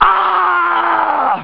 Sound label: scream; cstrike; predatormod